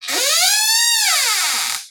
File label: Door Creak